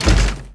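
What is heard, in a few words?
droid bodyfall large metal; droids robots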